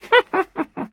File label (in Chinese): 女巫环境声